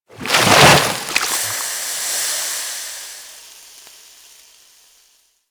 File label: Infested Moa Slow Bomb Dissolve; Warframe; Attacks